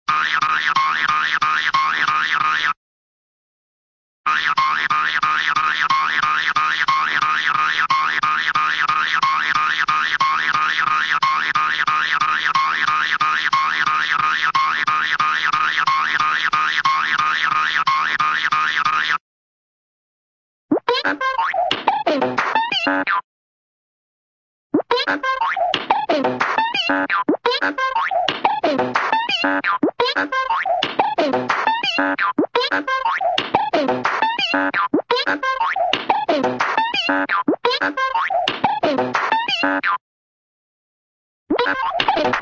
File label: hop, cartoon hopping sproings, short